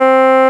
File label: flute c4